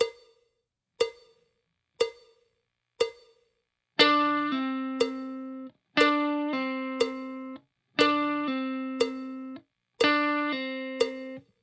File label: blues tricks slow, guitar